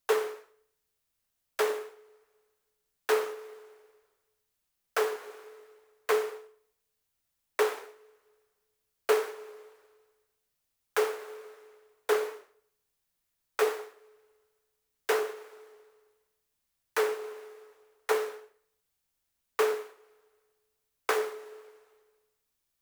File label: noise Snare